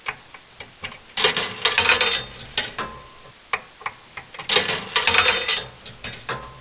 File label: vend; baseq2; mom